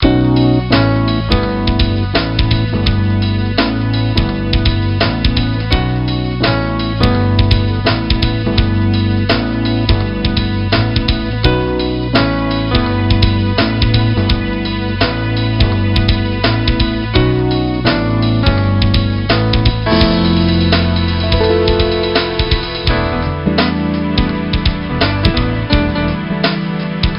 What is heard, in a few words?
Jazz; Nancy Drew: Secrets Can Kill